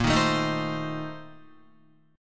Bb Major 7th Suspended 2nd Suspended 4th Bb down Guitar, BbM7sus2sus4 chord; BbM7sus2sus4 strummed; JGuitar